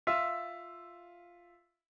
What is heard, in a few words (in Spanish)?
Intervalo Armónico